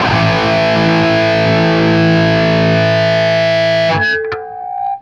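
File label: PWR A CUT, guitar, power chord cut; East West; Steve Stevens Guitar Samples Collection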